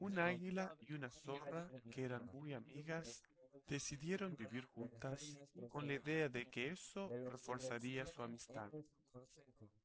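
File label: male, instantaneous mixture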